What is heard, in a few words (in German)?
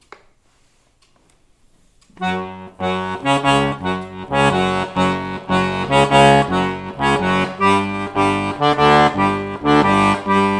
begleitung